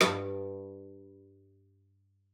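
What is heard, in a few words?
CELLO spiccato G2; 90 s Sample CDs; Xsample VOL-5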